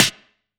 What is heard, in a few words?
DnB Snare; drumnbass 170bpm; Drum Kits; musicradar; Drum Hits B